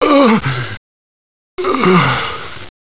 scientist die backwards

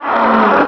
pain, krall; unreal

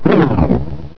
die

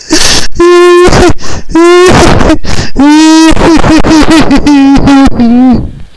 laugh

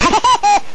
woodpecker

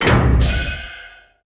door stop

